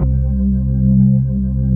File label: B3, Keyboards Old School; USB Soundscan vol.09; AKAI